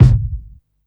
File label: rich lows kick sample e key